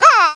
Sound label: CROW CAW